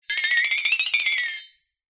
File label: cartoon flexatone